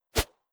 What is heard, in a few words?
knife swing, weapons; sniper; tf2classic